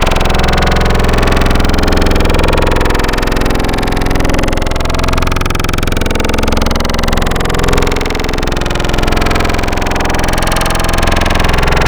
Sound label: Growl, Wavetables